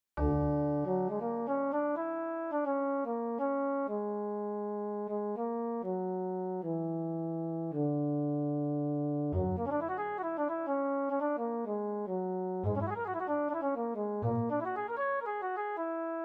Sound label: eskimo hexatonic